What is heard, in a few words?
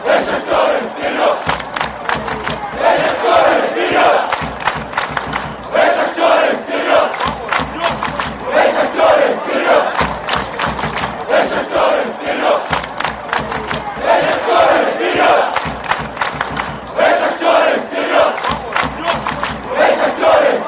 go shakhtar fanchants